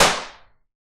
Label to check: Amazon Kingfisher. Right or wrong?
Wrong